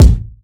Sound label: kick drum sound g key